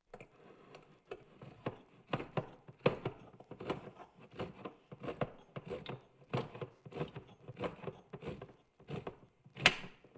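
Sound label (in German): In Der Küche Manueller Dosenöffner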